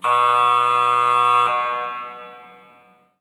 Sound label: Hockey Buzzer; Hockey Horns & Buzzers